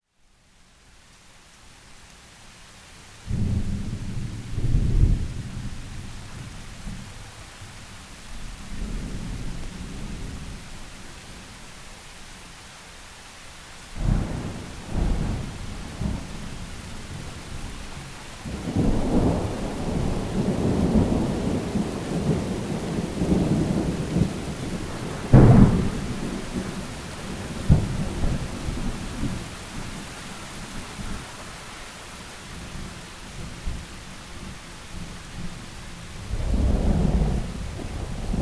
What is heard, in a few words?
thunder; Google Ambient